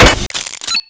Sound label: mine deploy; weapons; svencoop; echoes